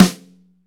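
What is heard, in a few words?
snare, Pop Country, Dry; Northstar; Drumscapes Roland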